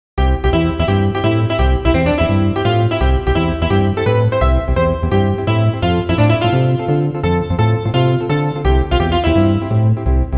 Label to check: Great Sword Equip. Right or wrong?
Wrong